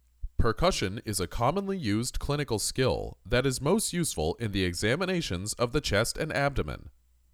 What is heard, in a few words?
Percussion